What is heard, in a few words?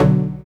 TECHNO Gb2; Jack Sparrow Deep Dubstep Progressions